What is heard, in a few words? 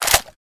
Sniper rifle 50 cal reload